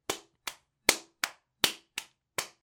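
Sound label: slap sound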